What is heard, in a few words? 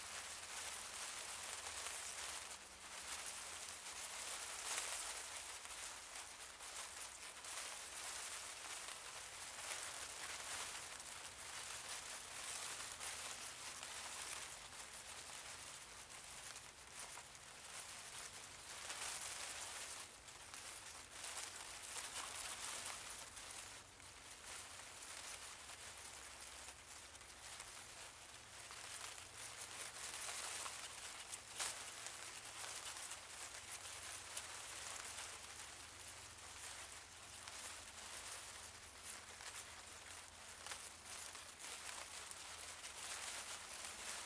tumbleweed loop